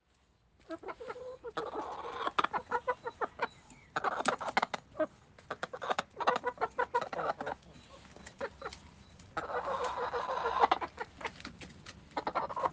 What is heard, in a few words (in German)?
Unsere Huehner